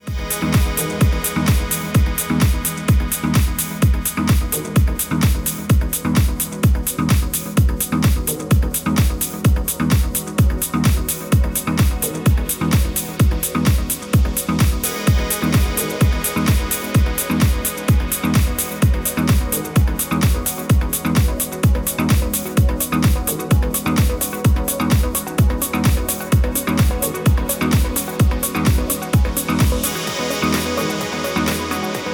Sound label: Krk RP8G2 House; studio monitors